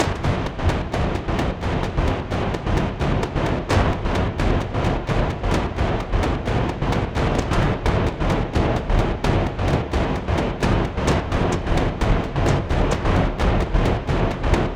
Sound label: Percussion Loop - 130 Cinematic; Ghosthack